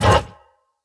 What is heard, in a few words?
mag in end; cstrike; valorant; reaver vandal